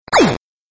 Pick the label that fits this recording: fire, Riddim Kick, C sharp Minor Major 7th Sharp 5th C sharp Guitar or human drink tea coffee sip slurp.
fire